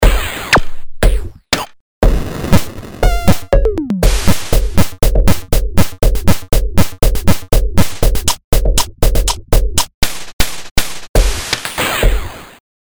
VIDEOGAME

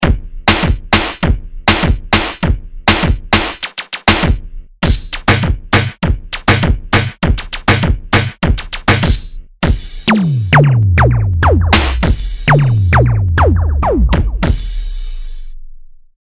Hard HIPHOP

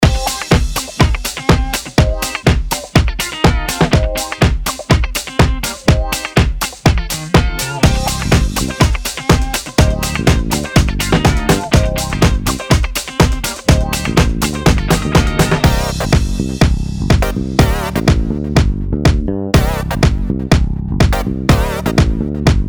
House

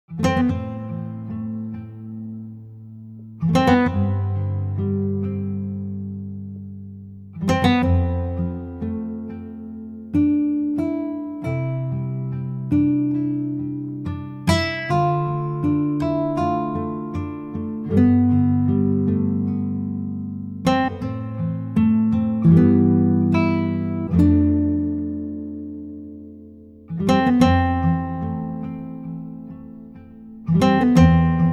Arpeggio, Classic Guitar; stereo acoustic nylon guitar; Arpeggio demo; made on a beta of ClassicGuitar, without any articulation; Pettinhouse

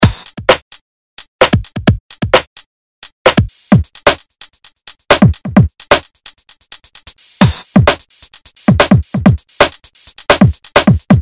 UK POP

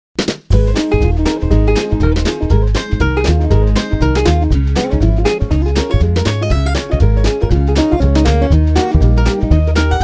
Southgrass, Little Guitar

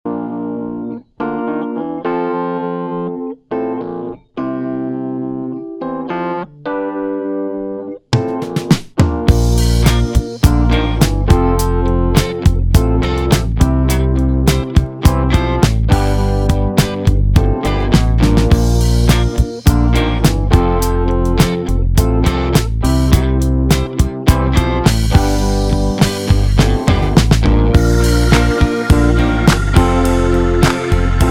Soul Kit

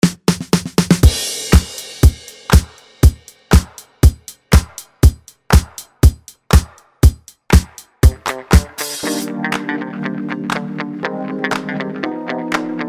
Pop funk

